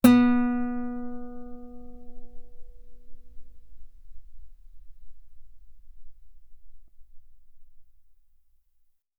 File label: Guitar ff sul B B3